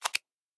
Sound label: Click